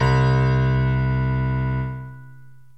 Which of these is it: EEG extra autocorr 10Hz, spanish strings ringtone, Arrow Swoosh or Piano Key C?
Piano Key C